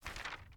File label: click drawing